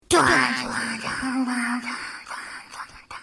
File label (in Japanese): ショック